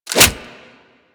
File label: Grenade Launcher Reload; Grineer